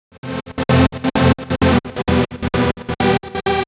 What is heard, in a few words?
Gated Synthline (130BPM); musicradar